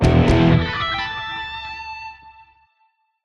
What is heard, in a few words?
Level Up Sting; PWND